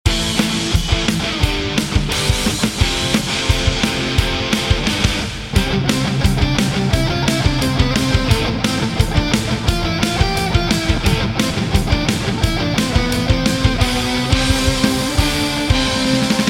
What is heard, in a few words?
battle music